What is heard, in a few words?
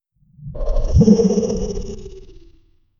a mysterious creature lur, thriller